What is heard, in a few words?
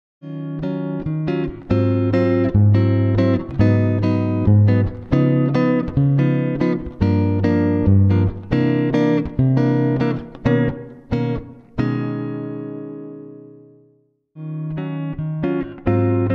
Godin switch chords